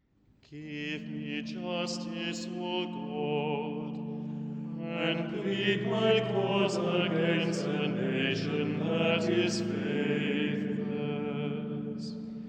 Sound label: Mode 5 Lent English